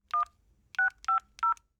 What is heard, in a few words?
phone dial